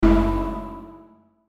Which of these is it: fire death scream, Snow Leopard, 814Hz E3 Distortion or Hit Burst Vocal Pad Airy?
Hit Burst Vocal Pad Airy